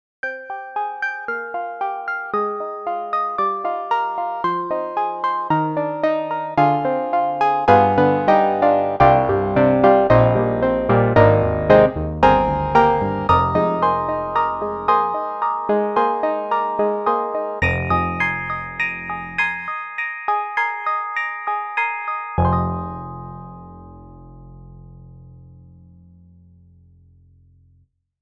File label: FM Hard Grand; Steinberg HALion 7